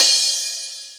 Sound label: CHH, Dance Drums; Best Service ProSamples vol.15; AKAI